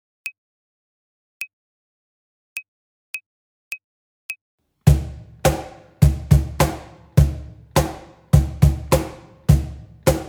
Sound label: Rock Pop Fills